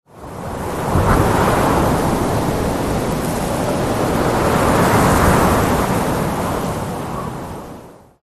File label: Sandstorm desert wind; ZhenShiXunYouJi2